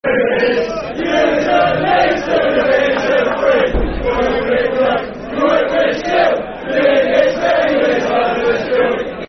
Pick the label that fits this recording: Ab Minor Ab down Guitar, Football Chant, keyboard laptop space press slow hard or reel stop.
Football Chant